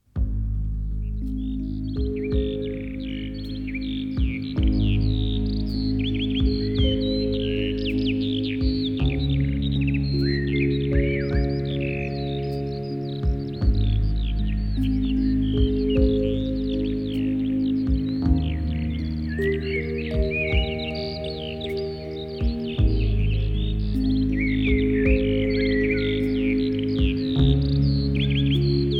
instrumental

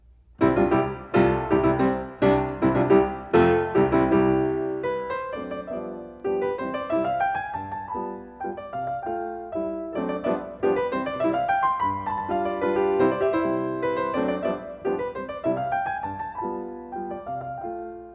Mazurka in C major; Frédéric Chopin

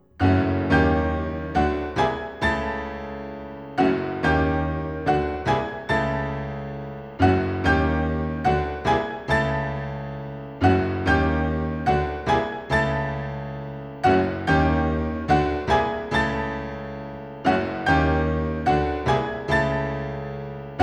for piano 4 hands, Marche funebre; Erik Satie; Cinema

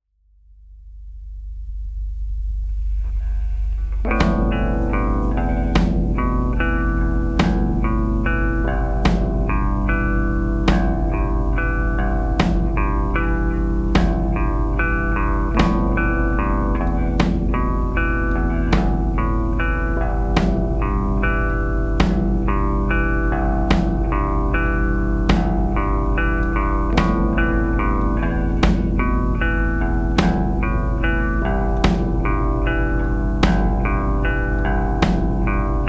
Instrumental

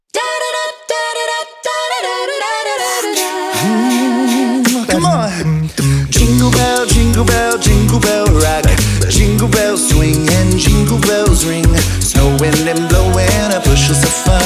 Jingle Bell Pop

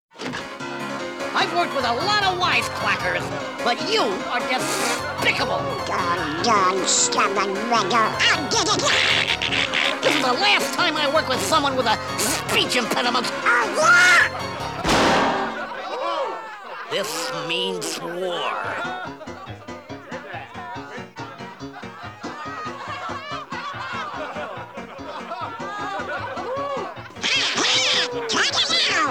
Hungarian Rhapsody (Dueling Pianos); Who Framed Roger Rabbit

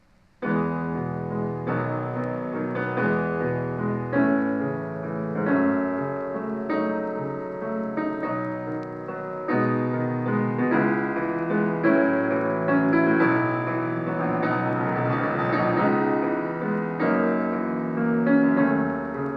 Prelude in E major; Frédéric Chopin

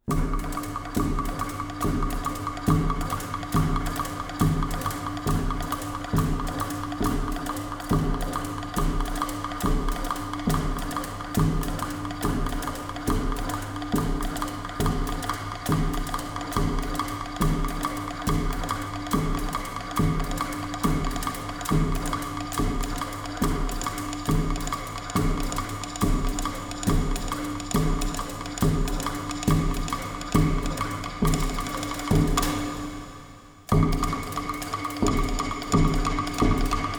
Cannibal Party Drums; Return to the Blue Lagoon

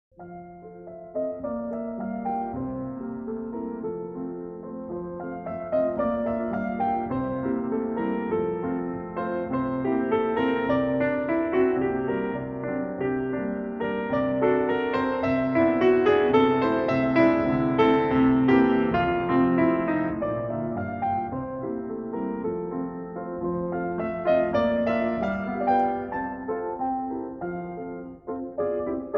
Piano Sonata in F-sharp minor, Aria; II. Aria

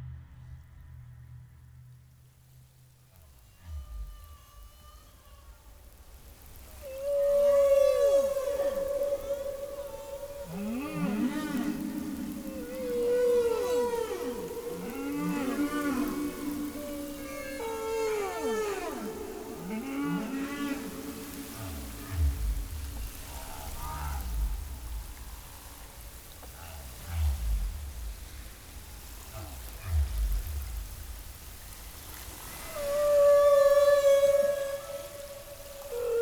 Humpback Whale Song

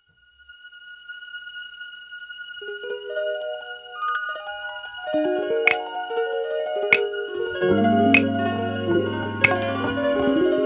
Brazilian Groove (instrumental)

